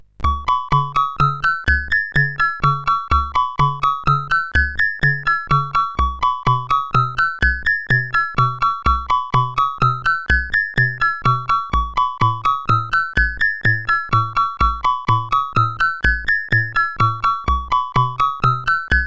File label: Yamashikoe sid CSG8580; hvsc